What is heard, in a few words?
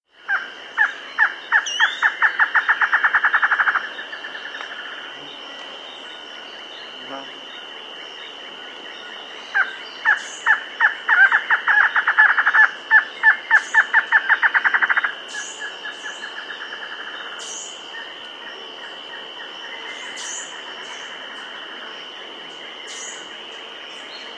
Natterer's Slaty-Antshrike; S. America